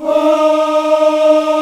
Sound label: AFROAH D#4, AF.VOWELS; Spectrasonics; Heart of Africa 1